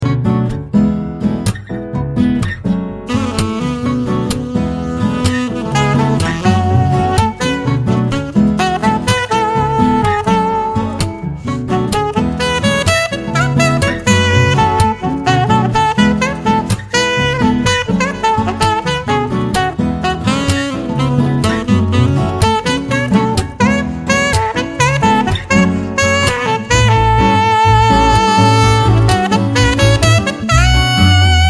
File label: Saxophone Solo Intro